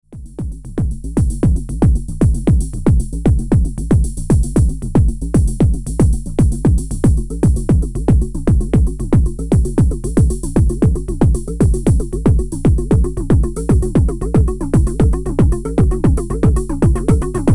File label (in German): Motor Synth MkII Voices externe Drums; Gamechanger Audio